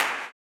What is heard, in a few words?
CLAP, Blues Soul; Spectrasonics Vocal Planet